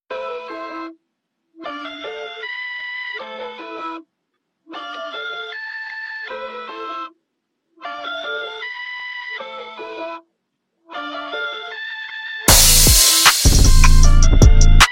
Instrumental Rap Hip Hop Beats Freestyle Old School Trap